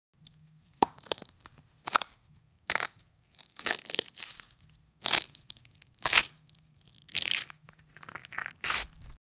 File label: asmr, fruit